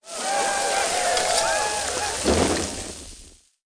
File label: tailgate grill tap